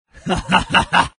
laugh, zh; Invo

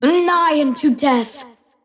attack pain; Invoker

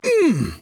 Grunt Attack; paladins; ShaLin

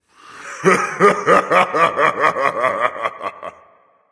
laugh; Cabrakan; Smite

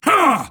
Grunt Attack; paladins; Androxus

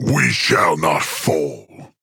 Taking Damage; paladins; Grover

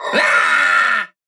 Grunt Attack; Paladins; Alchemist

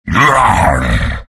animal sound; beastmaster

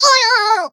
pain; Awesomenauts

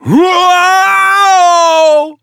Falling; Owl; Paladins

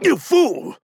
Taking Damage; DarkLord; Paladins

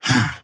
grunt jump; Paladins; Owl

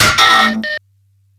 pain; Awesomenauts

